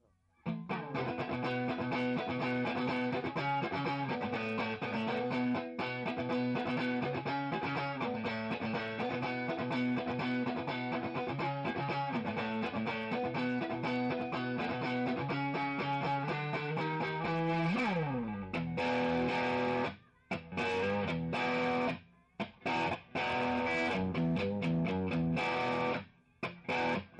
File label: Front Distortion